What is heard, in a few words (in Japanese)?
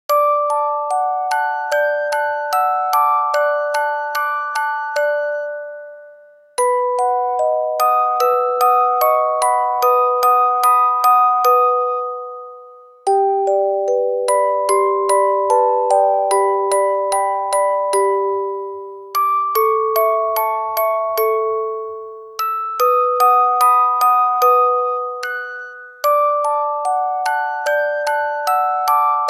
orgel